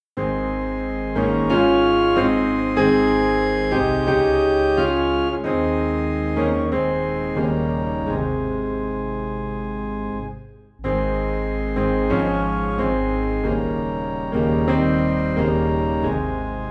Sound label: Piano and Organ Low in A Flat; I Surrender All